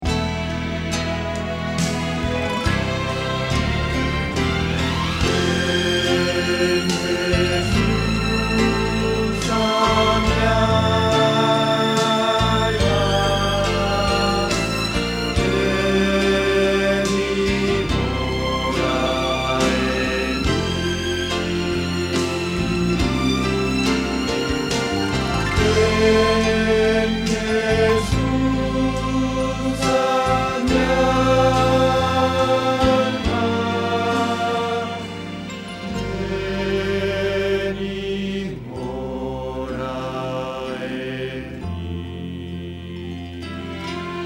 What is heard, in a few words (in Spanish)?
Bajo